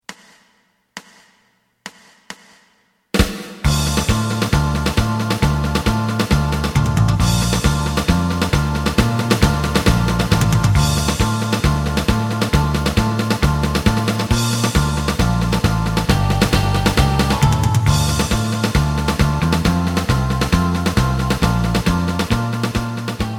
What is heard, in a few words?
lead